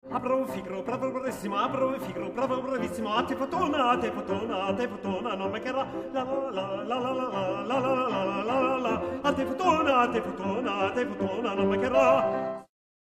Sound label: Largo fast bit